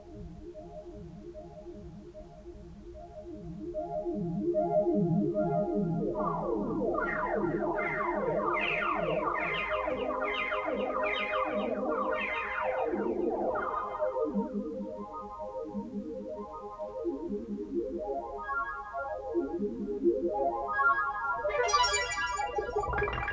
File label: moog origin voice